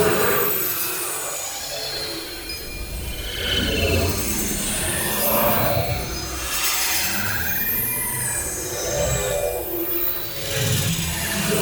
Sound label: Projection Loop; Tau; Somatic Bearer